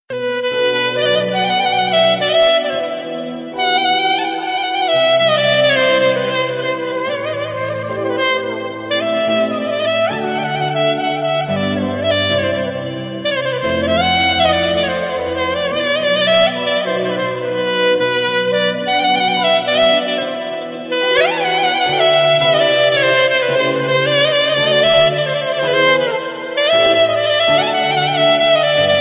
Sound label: Saxfone